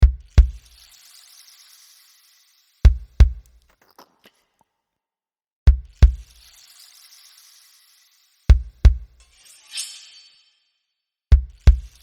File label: cupcakes instrumental